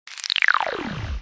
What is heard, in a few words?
win; 水果机游戏